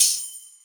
hats oneshot; Pop; Drums; Slate; SD PASADENA Pop Sample Pack

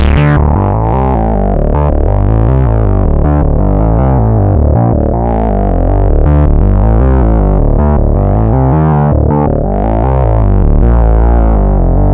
Synth loop 79 Dm, Synth Bass Loops; Slate Digital BASSHOLE Sample Pack

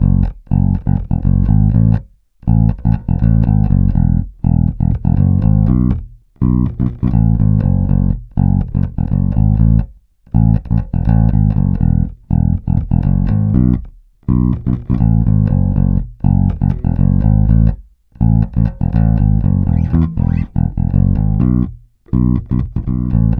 Bass loop Disco 122 E, Electric Bass Loops Direct; Slate Digital BASSHOLE Sample Pack

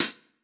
Open Hat, 909; Slate; Vintage Drum Machines Volume 3 909 Edition

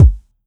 Kick, 909 Kicks

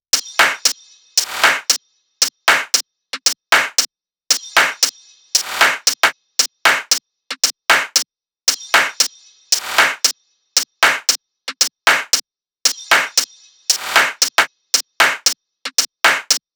tops 115, Drum Sample Pack Loops Top Loops; SD SEISMIC; Slate

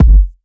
Kick Roundhouse, 808; 808 Kicks; Slate; Vintage Drum Machines Volume 2 808 Edition

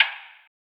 perc stocked, Hip-Hop Drum One Shots; Slate; CAICOS Hip-Hop Sample Pack

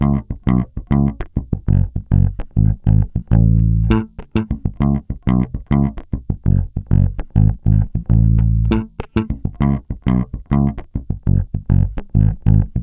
Bass loop 100 C#m, Electric Bass; Electric Bass Loops; Slate Digital BASSHOLE Sample Pack

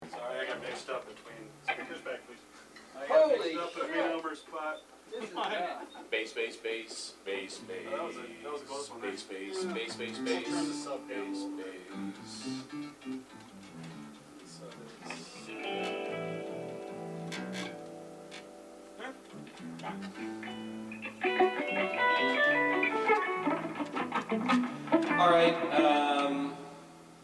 Background Chatter Mix

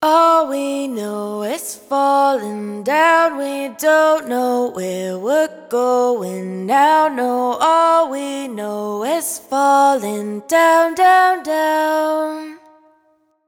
hook 126 C#m wet, Vocals; Slate Digital Omnivox Vocal Sample Pack

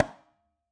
conga Single Hit Slap; Raw Perc One Shots; Slate; SD SEISMIC Drum Sample Pack

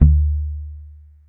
Bass Muted Long D, Electric Bass One Shots; Slate Digital BASSHOLE Sample Pack

